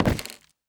Footstep Wood; Opsive; UltimateCharacterController; Footsteps

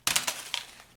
Paper Drop; Daz3D

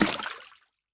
Footstep Water; Opsive; UltimateCharacterController

